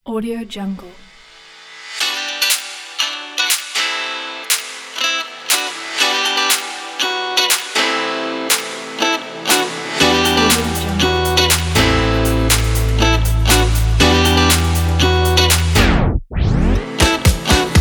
summer dance pop

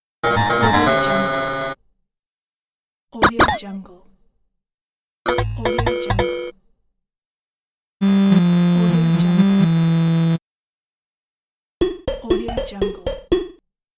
lofi console old school

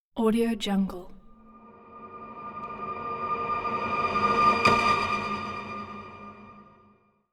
rising symphonic stinger

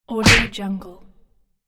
face punch hit

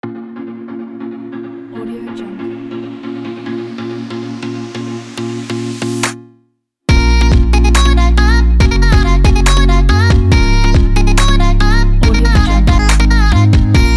beauty pop beat

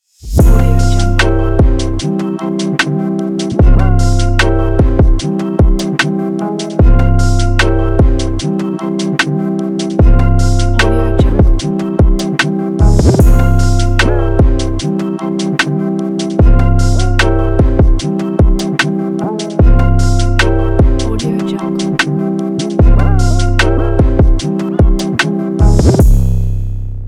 drill trap logo

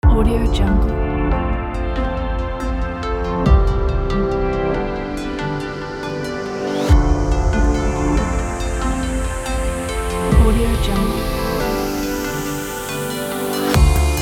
digital corporate technology documentary

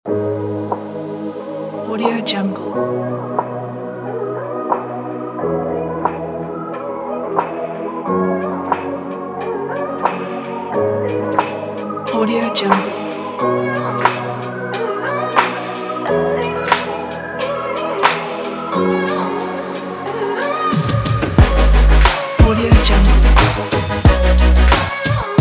uplifting future bass

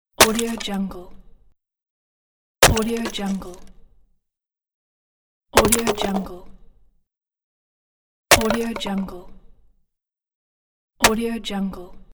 axe chops firewood

